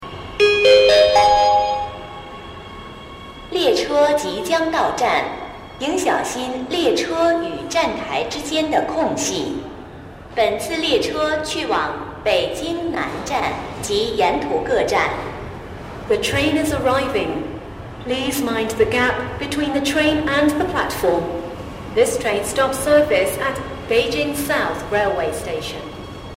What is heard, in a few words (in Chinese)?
进站; 14号线北京南站方向